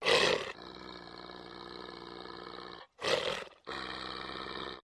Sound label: Dog Large Barks Snarl